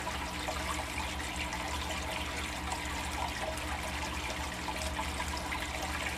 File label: Fish Tank Filter